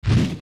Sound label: Spin; Poe Sister; zelda; OOT